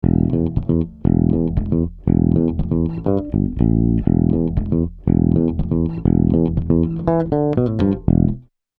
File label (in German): E-Bass; Tegeler Audio Vocal Leveler; Gain 4